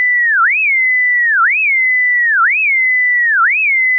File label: FM modulator